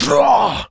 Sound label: Pain, Zombies; zps; Carrier